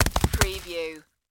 Bullet Machine Gun Impact Earth

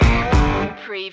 footstomp swamp blues stinger

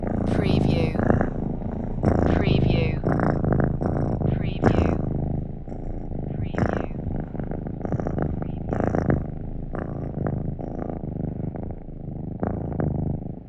Cat Purr Deep Heavy

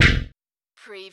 Button Sound - Metal Low Plate

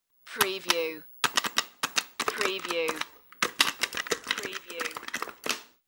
Joystick

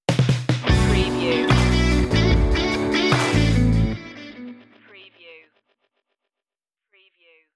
give a little stinger

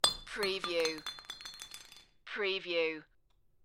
Glass Bottle Drop Bounce

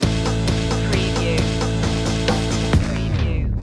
fuzzy gritty skank stinger

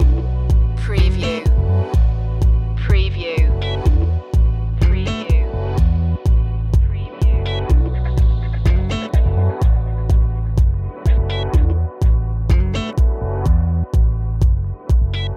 calypso loop, chill

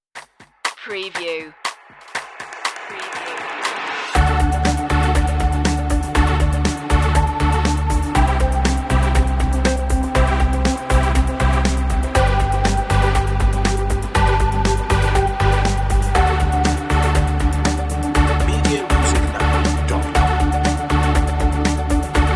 synth inspiration

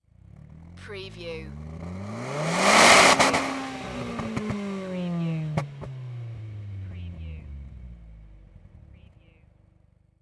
NISSAN SILVIA EXHAUST SINGLE REV

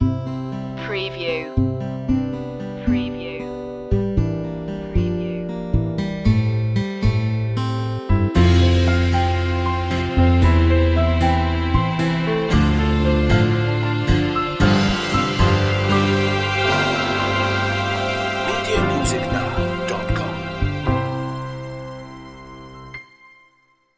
springtime of life no drums

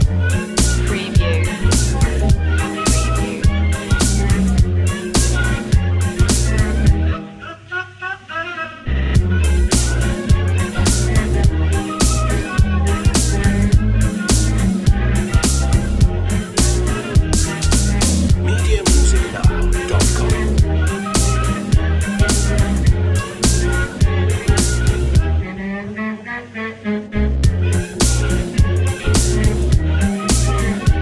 rag step

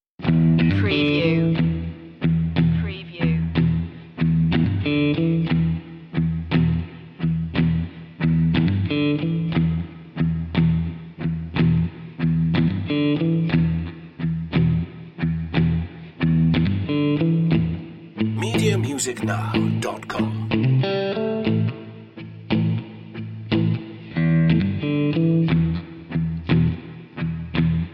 slow blues underscore

